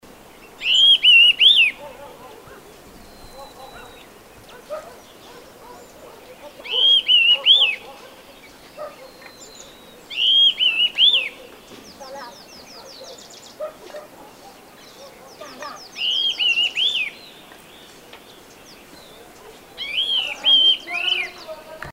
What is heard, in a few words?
Rufous chinned Laughingthrush Ianthocincla rufogularis occidentalis